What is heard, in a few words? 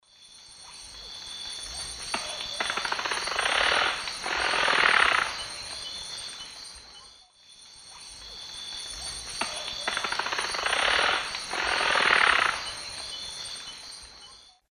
Pipile pipile, display wing rattle; S. America; Eastern Lowlands